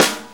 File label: Snare B; Sonic Foundry (Sony Creative Software); Loops & Samples Drum Tools